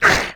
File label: Toad Dmg; Toadrin; Fly For Insanity